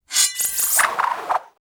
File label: quick metallic scrape and flourish